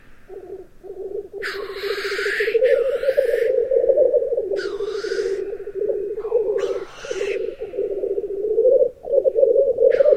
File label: black grouse